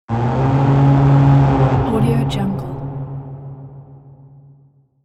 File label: cinematic war horn